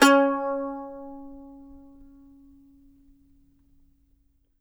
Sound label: MANDO NOTES, C#4; Sampleheads; Whole Lotta Country 1 & 2